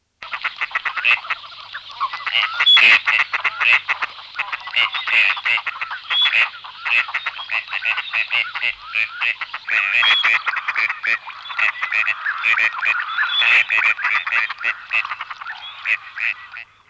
Kendermagos rйce; Madarak

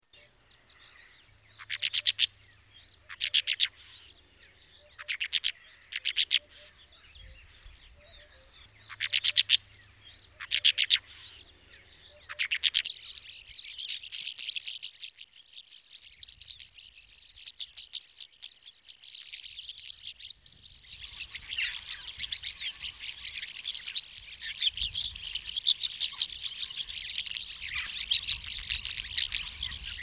Pбsztormadбr; Madarak

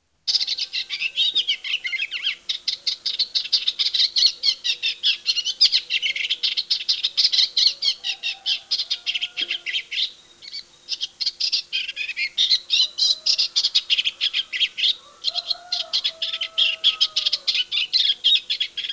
Halvбny geze; Madarak

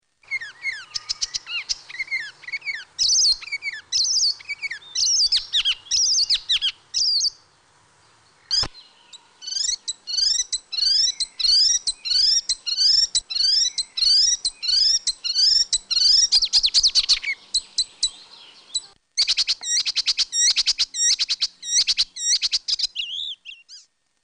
Kerti gézé, Madarak

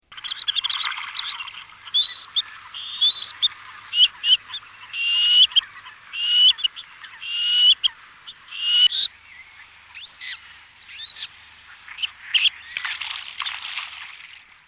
Feketenyakъ vцcsцk; Madarak

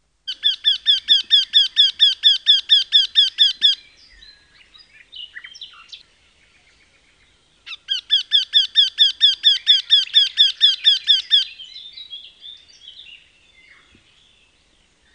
Nyaktekercs; Madarak

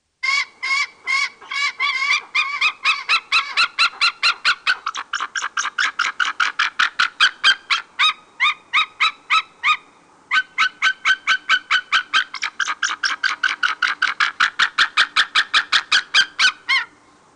Rйtisas; Madarak

